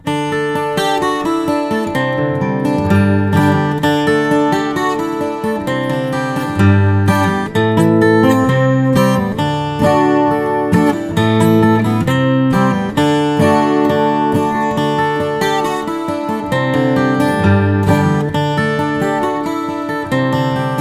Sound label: Picking